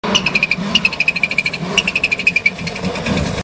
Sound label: Subaru Wrc